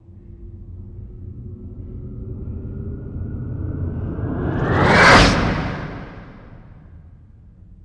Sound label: Starship By Whoosh